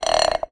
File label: Bolt Glass Scrape, Medium Wine Glass